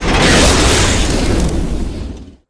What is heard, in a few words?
lava trap start, machines; Quake 4 Alpha